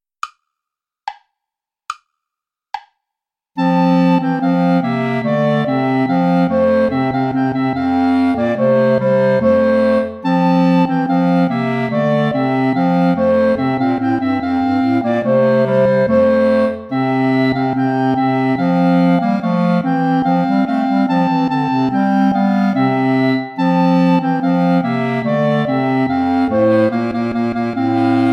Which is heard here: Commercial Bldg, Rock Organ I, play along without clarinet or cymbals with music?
play along without clarinet